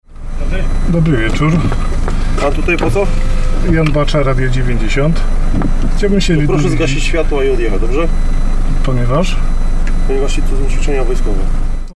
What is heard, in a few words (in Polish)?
helikopter; pszow